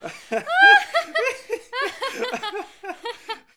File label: Woman Man Laugh, laughs-chuckles